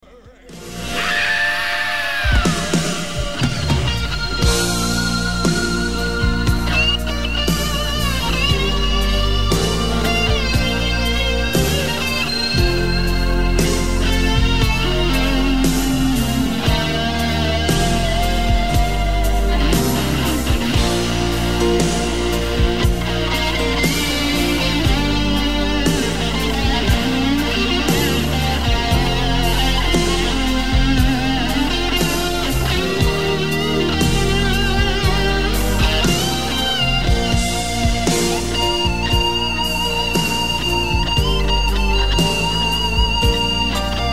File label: guitar solo